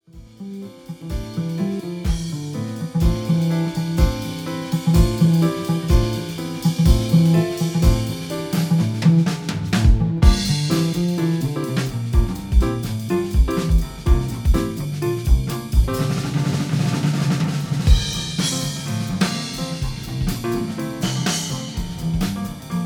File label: jazz trio